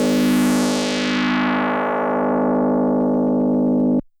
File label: DX100 B3; rocket